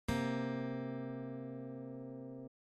intervals harmonic minor seventh, guitar

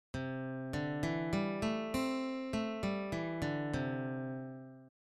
c major pentatonic, guitar

